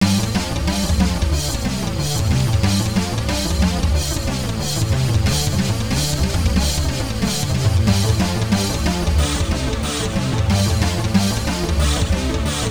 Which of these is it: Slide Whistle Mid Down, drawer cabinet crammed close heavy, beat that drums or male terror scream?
beat that drums